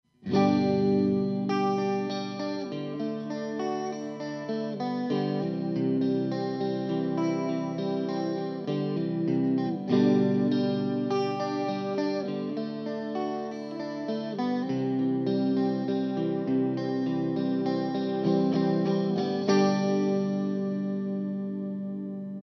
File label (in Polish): wstep, gitara